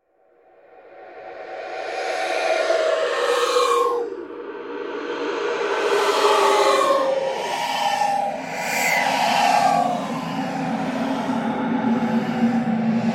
Reverse Stinger - Eerie Multiple Child Breaths; Hollywood Edge Sound Designers Tool Kit

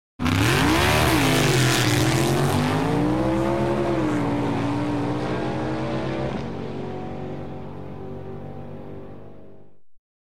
Dragster Run; 100 sound effects

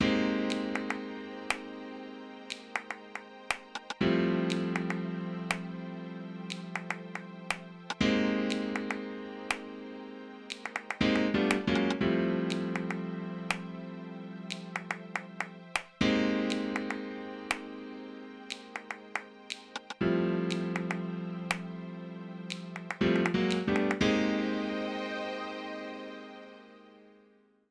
Speedy Service

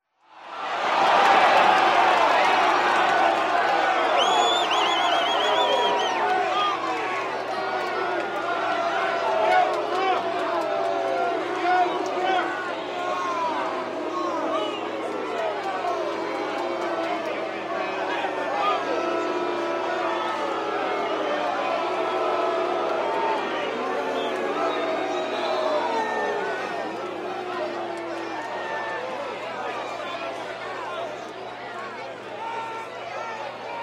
Wrestling Match; SOUND EFFECTS1

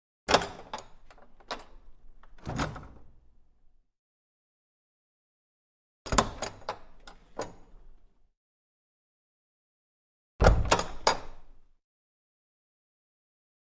Small Wooden Door With Latch; Hollywood Edge Historical Series; cd4 Castles Palaces & Monasteries

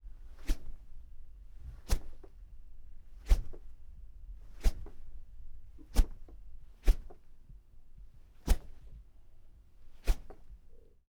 Fly Swatter Swish Light Whoosh; Foley - Props; Foley Essentials

